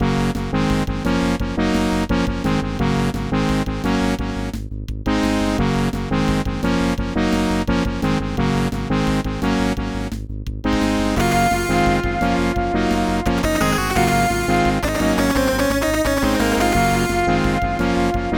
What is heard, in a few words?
Harbour; 1942